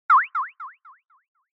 Anime Motion Delay

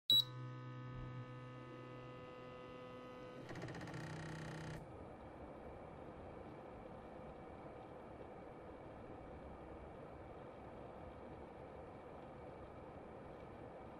Air Purifier Low

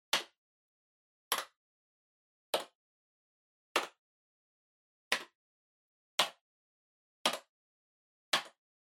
PC-Keyboard (Far-Single-Hard)

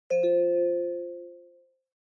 OS Sound (Speaker-Notification)

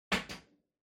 Lighter Far Drop

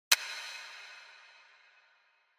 Camera Disposable (Shutter)

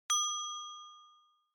Onoma Ding High Short

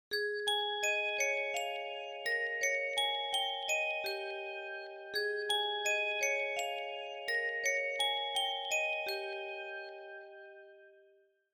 Smartphone Ringtone Dry

